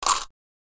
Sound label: clink coins dropped into plastic tub real